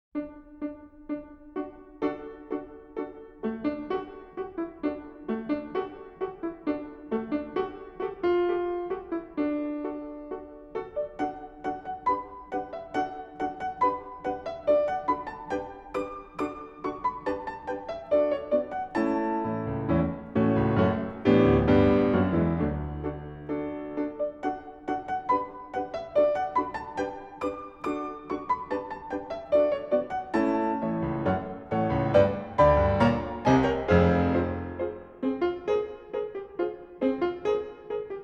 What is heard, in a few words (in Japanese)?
ピアノ小品; プロコフィエフ 「ロメオとジュリエット」よりピアノ小品 「バルコニーの情景」; Stereo Sound Reference Record